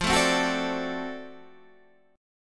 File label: D 7th F, guitar; D7/F strummed; JGuitar